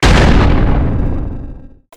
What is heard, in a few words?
Thunder Magic Sound